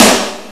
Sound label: snare